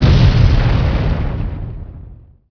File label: explosion large; Emperor - Battle for Dune